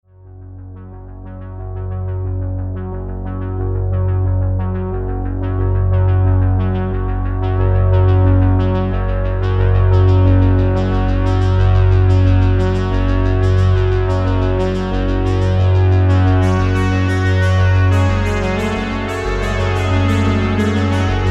roland juno 60

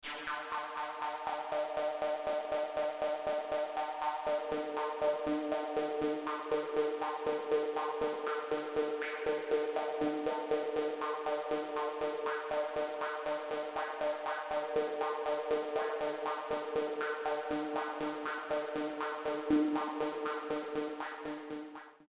yamaha cs5 solo

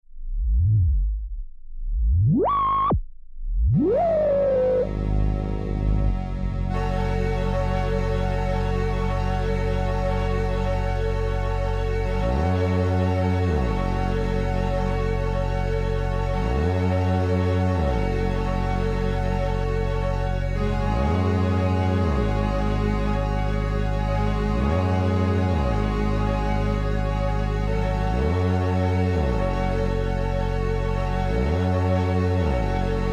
siel cruise mono poly

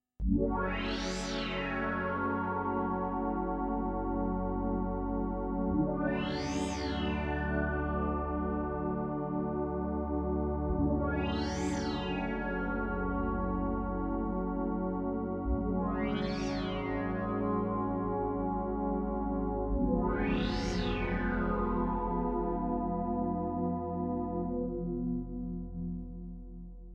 roland jupiter 8

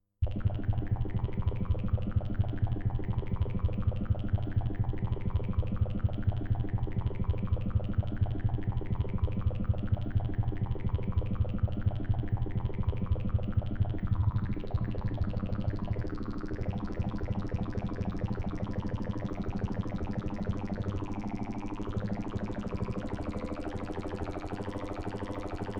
yamaha cs 5; fassbier

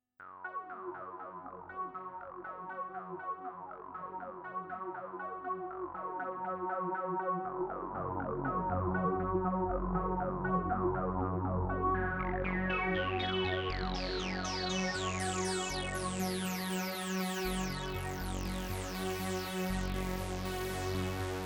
roland jupiter 8 arpeggio

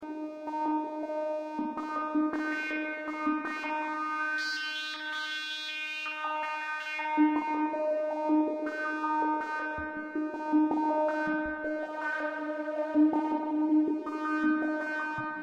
yamaha cs5 solo

